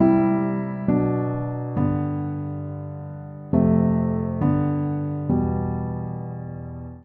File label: Progression VI-v-III-bII-III-i E Minor 136 bpm, Upright Piano; MIDI Clips; Leather Jacket; Ableton; Factory Packs; Spitfire Audio